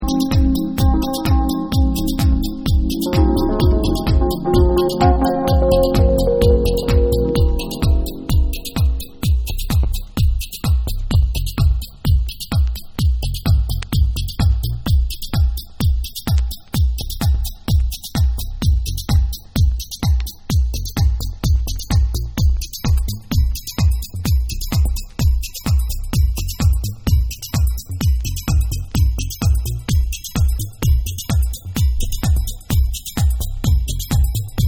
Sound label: Remix